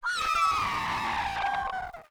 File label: wyvern death